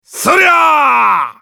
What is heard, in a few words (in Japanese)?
攻撃; エデュアルド